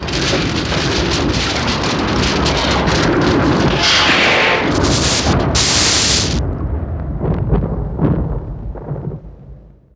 fight scene; Cartoon - film effects

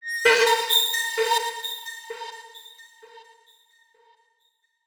Fx 130, Techno; Techno Sounds 2024

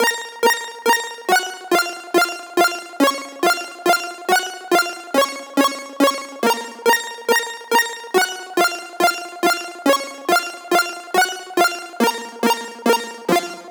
Riddim Synth Loop F 140; Game Over; Ghosthack; Riddim Essentials 2020

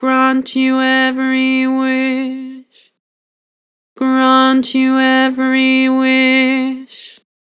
Vibrato Grant you every wish, Harmor Vocals

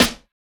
Snare, C#; Drums; One Shots; Humble Institution Snare; Cymatics - Humble Hip Hop Sample Pack

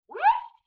Wind Whistle Zip; Vintage Cartoon; Musical Elements

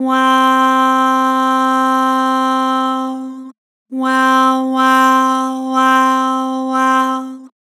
"Wow", Vocals; Harmor

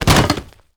On Chairs; Fairlight Sound Lib

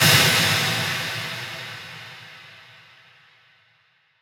Noise Impact; Psytrance; FX

